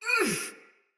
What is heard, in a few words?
Pain Soldier Mace Agent Female; Battle; Sinecure Melee